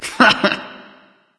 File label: hehheh; bellona